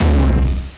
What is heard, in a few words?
Low Tom; Percussion; 99Sounds; Dark Engine; Burnt Hits